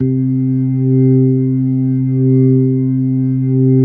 C3S, B3

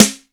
RAP SNARE, HR-16; Hollywood Edge; The Big Bad Beatmachine; HR-16B

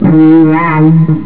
elec gt fun; jazz jackrabbit 2

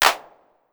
Clap 909, Clavia DDrum AT, Pitched Down

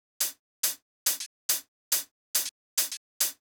drum Loop 140BPM HH; Vengeance - Essential Clubsounds Vol.5; VEC5 Drumloops; VEC5 Multi Loops 140BPM

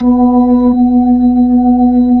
B-3 Jazz; Keyboards of The 60's and 70's

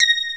FAIRLIGHT; Greytsounds

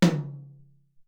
Room Tom H, Alesis SR-18

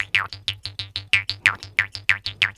KHOMUS; USB Soundscan vol.60 - Asian Traditions; AKAI

